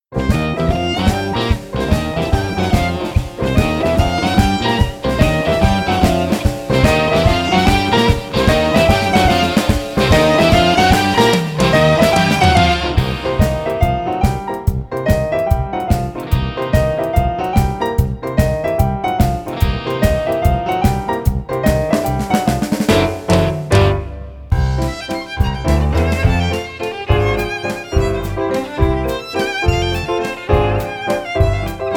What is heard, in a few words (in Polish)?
Lubie bale wersja instrumentalna